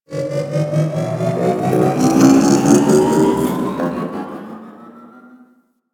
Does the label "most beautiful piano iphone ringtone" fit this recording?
No